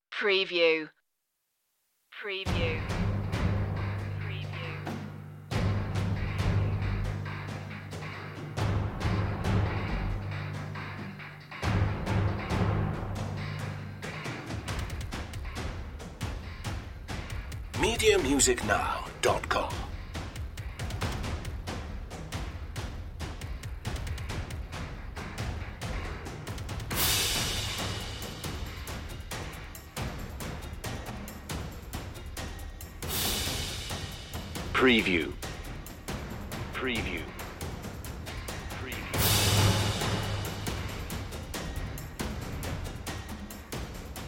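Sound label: revenge of the demons percussion no drum kit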